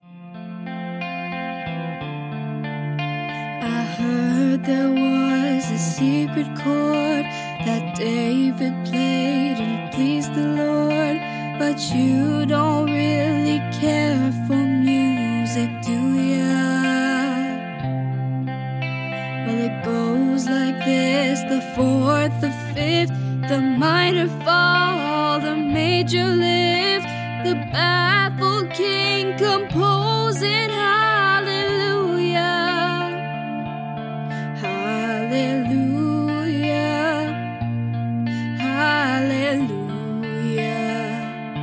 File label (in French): chantee, Hallelujah